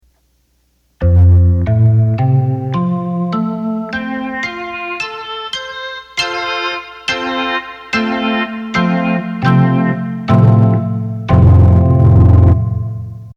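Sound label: Rock Organ, Roland accordion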